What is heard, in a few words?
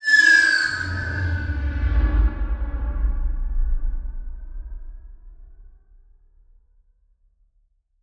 Title Transition Descend